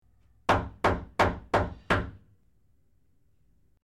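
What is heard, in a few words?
Rapid Knocking On Wood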